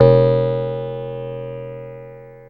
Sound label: ANALOG BELL; Zero-G; Ambient 1